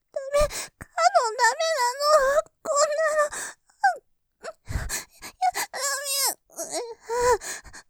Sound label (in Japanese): 喘ぎ らめぇ こんなのぉ さらにとろとろ喘ぎ; モノラル; ループで楽しむ！シェフの気まぐれ抜き抜きコース